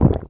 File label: toxic slime gurgle; ambient; canals; source engine; hl2; video games